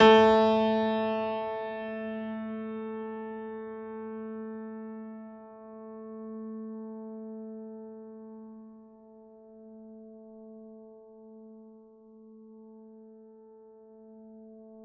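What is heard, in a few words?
Classical Piano A3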